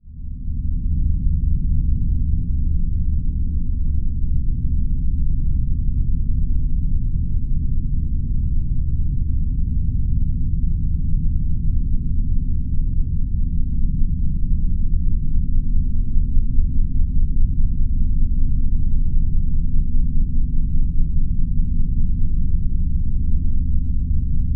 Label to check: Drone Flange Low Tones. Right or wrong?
Right